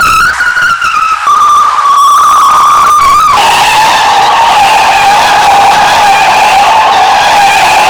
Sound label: skid dry, Tires; Kart; AOS RF1 Kart Demo